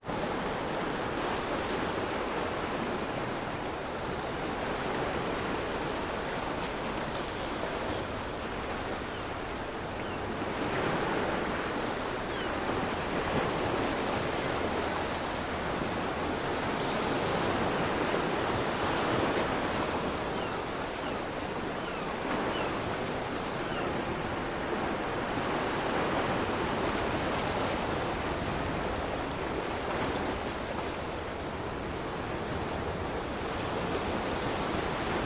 amb coast port surf close; fvtt sonniss gdc audio

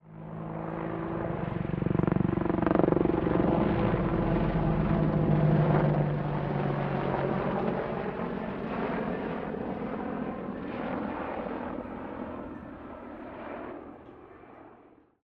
Apache AH64 helicopter; sonniss gdc

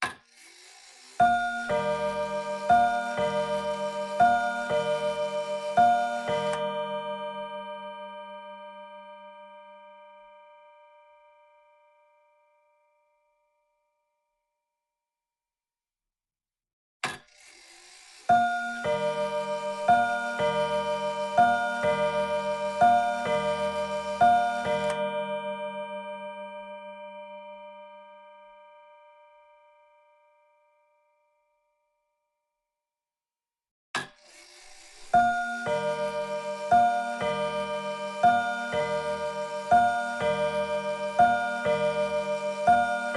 old wall clock 1920 chimes ringing stereo

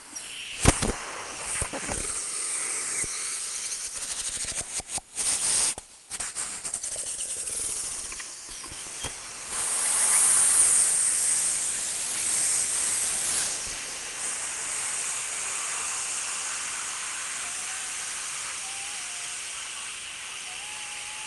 dynamic vacuum suction loop; sonniss gdc

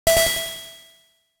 notification; Sonniss GDC

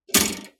metal element spring; sonniss gdc

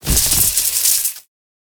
chain lightning secondary strike; psfx; 3rd level spells; call lightning

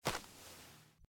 footsteps sand desert; weather and nature

